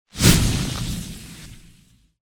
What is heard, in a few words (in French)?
sorcier spawn